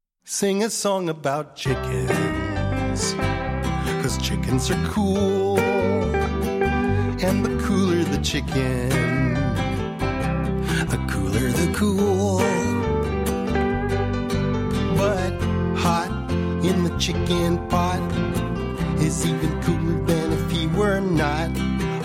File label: Chicken Song